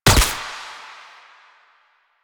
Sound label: Pistol Shot; Weapons; DE; Warframe; Tenno; Lasrian